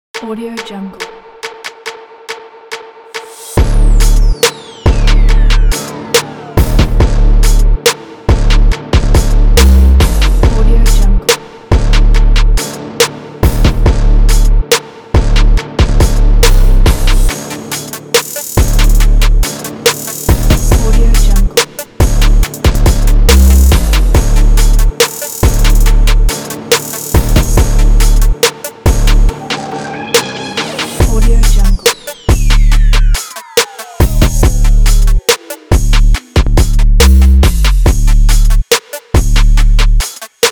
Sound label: sport trap